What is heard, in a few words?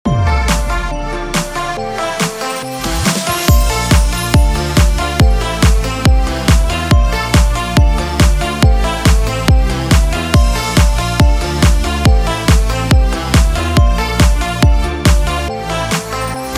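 CHRISTMAS A# minor 140BPM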